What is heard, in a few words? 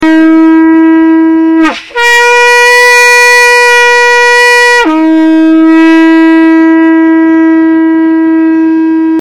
shofar 3 notes